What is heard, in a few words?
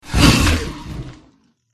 dragonkin death